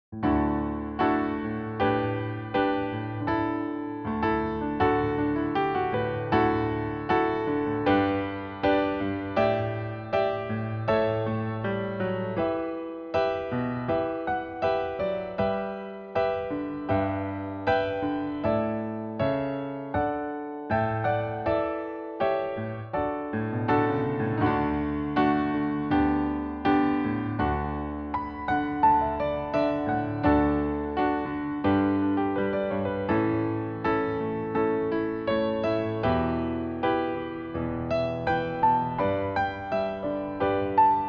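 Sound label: accompaniment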